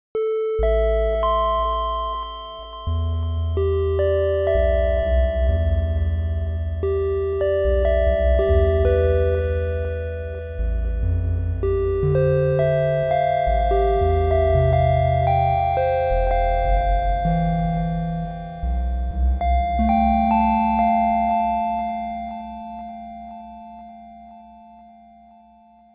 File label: music; evoland; classic